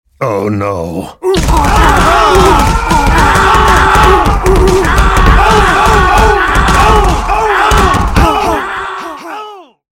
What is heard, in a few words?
death; tf2; freak fortress 2; gamblerv2